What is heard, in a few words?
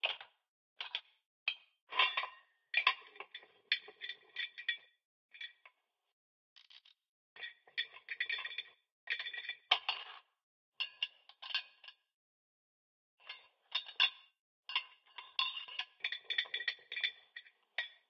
Repair; raw game audio files; Mita; MiSide